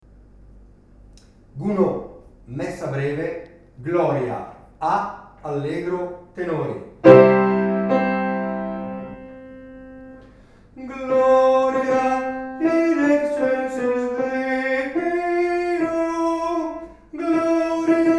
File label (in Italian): Gloria allegro Tenori; Messa Breve Gounod